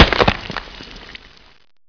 flesh bloody break; physics

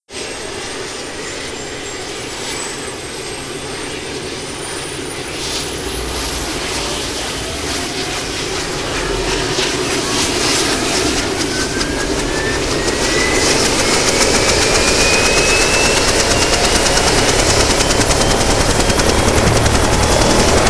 chopper startup looped

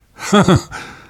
laughter; namvet; Left 4 Dead 2; left4dead2 dlc2

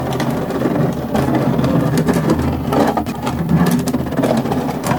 plastic box scrape rough loop; physics

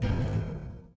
ladder, footsteps, run; boomer

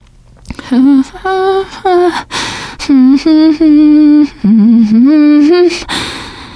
nervous humming, teen girl; player; survivor; voice

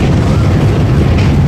coaster loop

